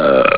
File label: kaboom; OBEs; An Accidental Expert's, How To Leave Your Body Handbook